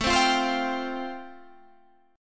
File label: A-Minor 7th Sharp 5th, Guitar Standard, chord; Am7#5 strummed; JGuitar